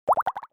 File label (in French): bulle; transformice